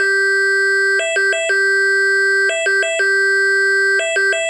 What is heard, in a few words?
Italian Firefighter; Tonfolgen Hella; Dachbalkensystem RTK 7 Konfigurator